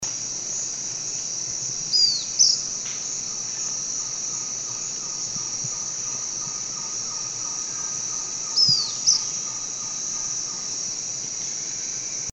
canto do papa moscas uirapuru

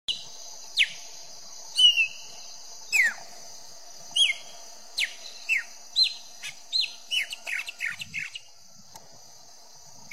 canto do grauna

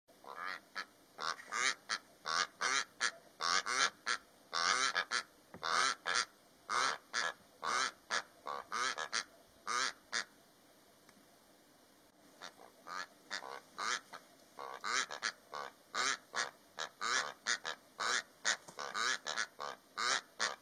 canto da abetardas de barriga branca

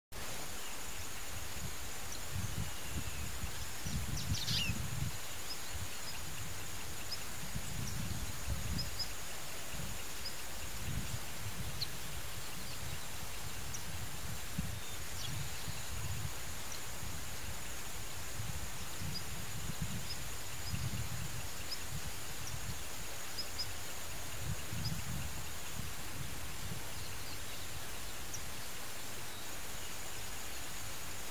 Canto do joao graveto

